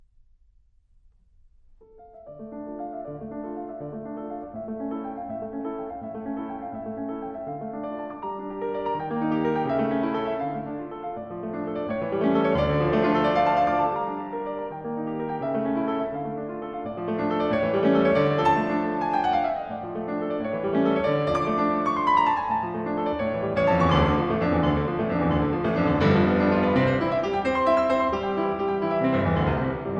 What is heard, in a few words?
Piano Sonata in D Minor, Allegretto; Beethoven Works For Piano